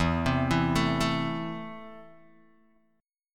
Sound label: E Suspended 4th Sharp 5th E, Guitar, DADGAD; down